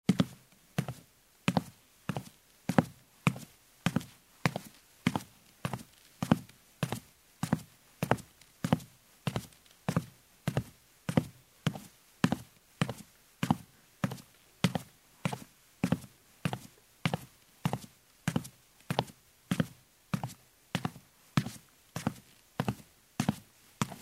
Steps on the wood floor in shoes

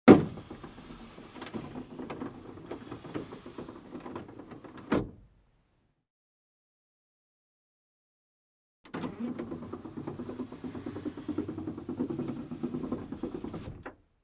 Car glass lowered, raised manually

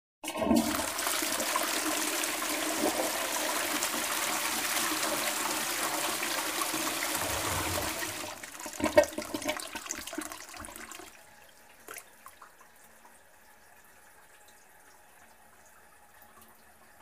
Toilet bowl, flushing and filling

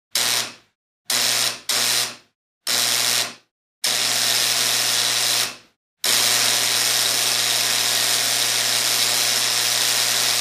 electric door bell; Eelectric door bell type 3

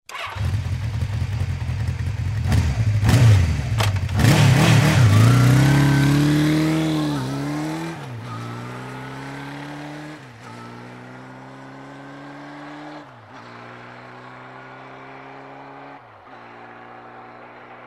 Motorcycle starts, waits, drives away

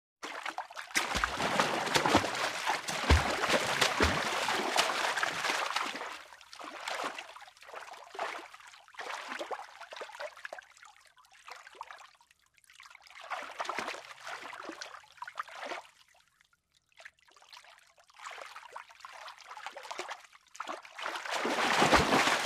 Splashing in the water

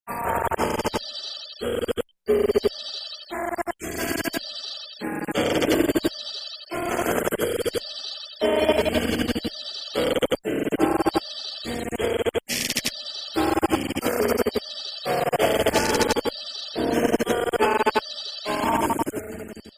space alien radio signal

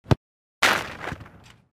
Football, kick the goal, hitting the net

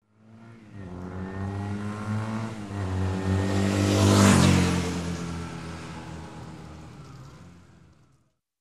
Motorcycle passes by quickly

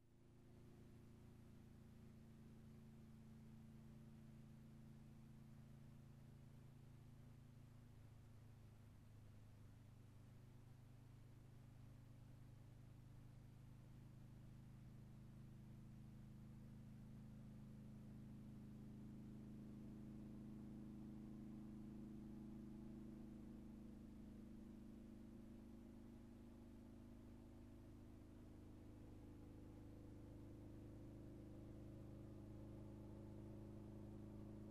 Motorcycle approaching, turning off

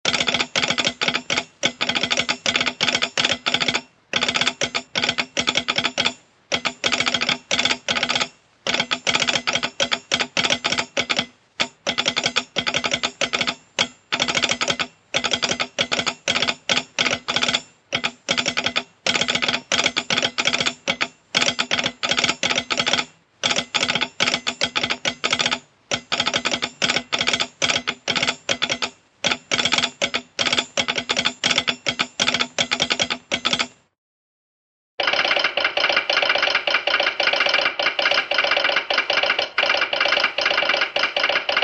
Morse code transmission; radio signals

